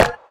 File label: E-Perc Strike Burned, Electronic Percussion; Drums; Ableton Live 12 Lite; Core Library